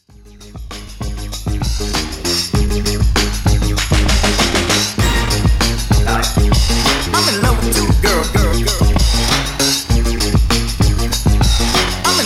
Classic Mix 98 Bpm; djyan

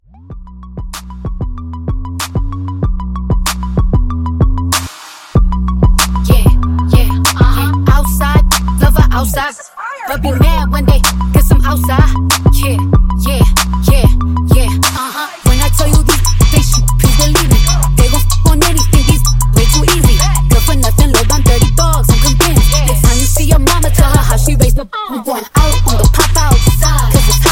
Twerk Mashup

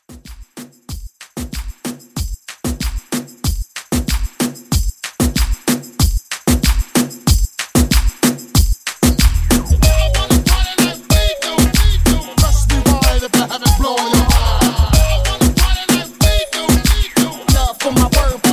reggaeton, 94; Re-Drum

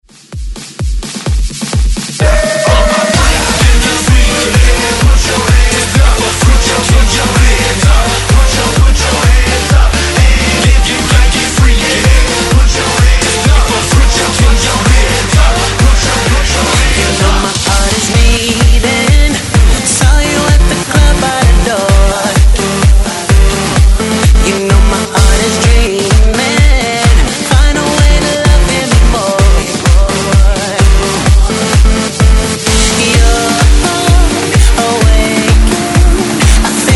Freaky Hype Mix 128 Bpm; DJDOLLS

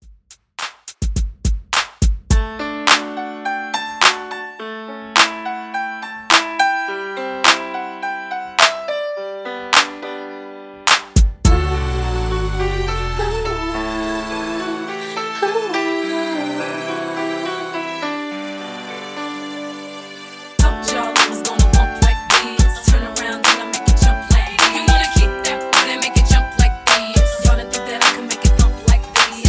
Throwback 90s ReDrum 105 BPM

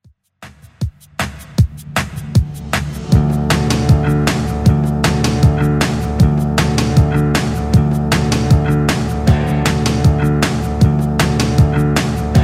80s Rock Redrum 156bpm

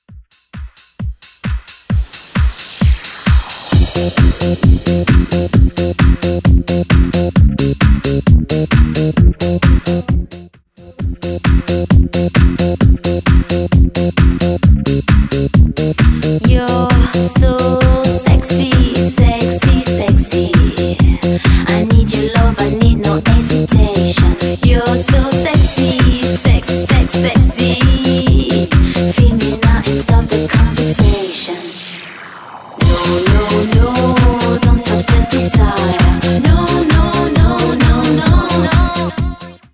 Sexy Redrum

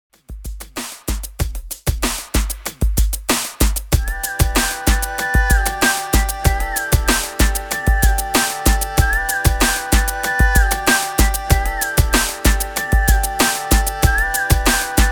BEATBOX REDRUM 95 BPM

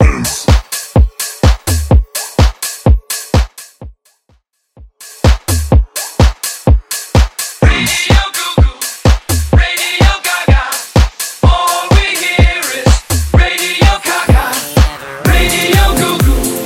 80s Rock Future House Edit

